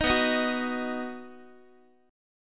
B Major, Guitar, 4A,4E,5A,5D,5G sharp,6C sharp; strummed; 18,19,18,16,x,x

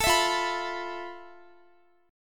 F Major Sharp 11th, Guitar; strummed

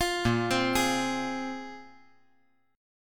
A sharp 7th Suspended 2nd; arpeggiated; JGuitar

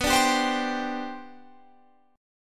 C Suspended 2nd, B, guitar chord; strummed

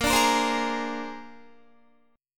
A sharp 9th A sharp Guitar; strummed

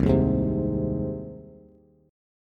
C Minor Sharp 5th C down Bass; strummed; JGuitar

